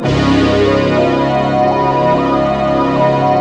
SNDTRK; Rubber Chicken Software Sample Bank

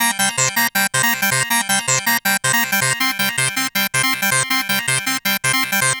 Dance Synths, Hardcore Loops, Synth Loops; Best Service ProSamples vol.37

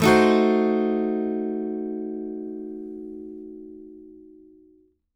ACCGTR DIMCD, Whole Lotta Country

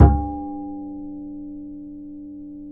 D 4, bass, acoustic fx; Spectrasonics; Bass Legends

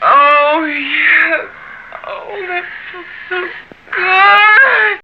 SEXVOX; X-Static Goldmine 1

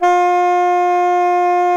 F#3B, tarogato; SoundBytes - Early Patches

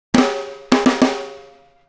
snare fill; Drums; Snare Patterns; Essential Percussion

